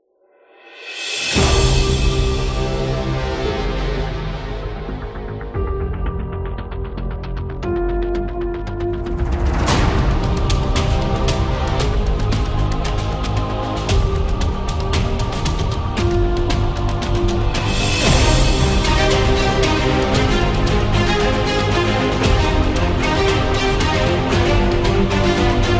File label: Dramatic Cinematic Trailer; epic action